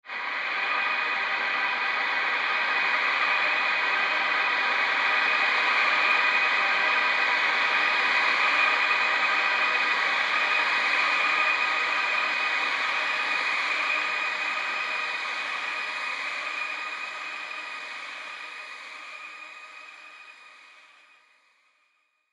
Horror Pad; Scary Soundscape Sound Effects

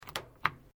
Washing Machine Toshiba Switch Off; Household Appliances; Laundry

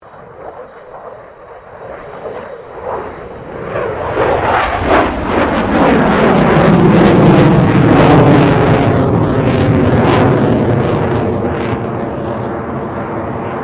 2009 FA-18E SUPERHORNET MANEAUVERING; RAAF FA-18E Super Hornet; Airplanes; Vehicles

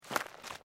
FOOTSTEPS SNEAKERS UNDERBRUSH STEP

